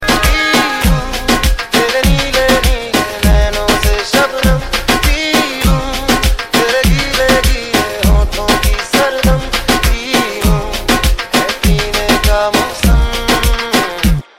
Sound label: dhol Mix